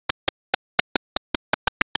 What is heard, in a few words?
nice clicking, motion graphics